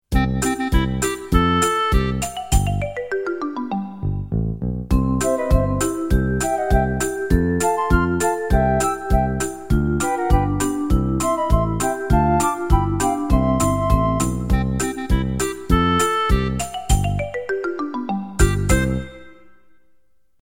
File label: Karaoke